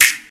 2000s Rap Steel Snare Drum C# Key, Royality free snare one shot tuned to the C# note